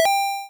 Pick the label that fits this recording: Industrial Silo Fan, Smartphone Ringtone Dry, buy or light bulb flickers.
buy